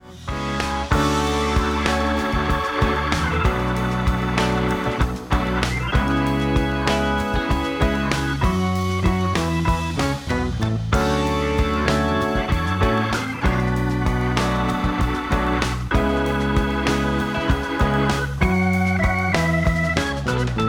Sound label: organ loud; Something’s not quite in its place; Mixing for God audio examples